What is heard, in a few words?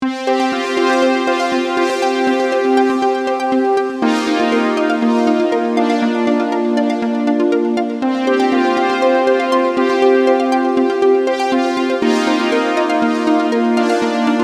Pulsing